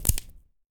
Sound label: woodstick, smashing; 1000fx